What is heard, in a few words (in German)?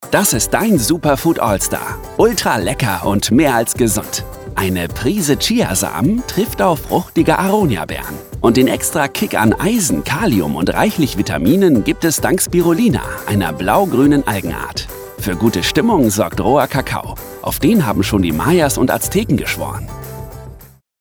produktvideo lebendig jung musik